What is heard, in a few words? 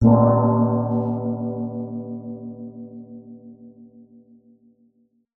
musical instrument gong small gamelan hit soft